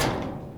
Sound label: hit